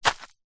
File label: footstep single sandal woods ground stoney path; zapsplat; foley